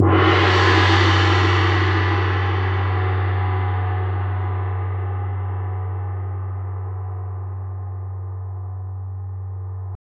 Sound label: PRC ORCH